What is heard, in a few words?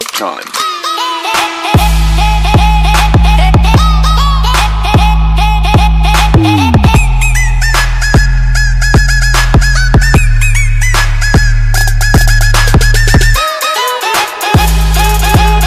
Tribal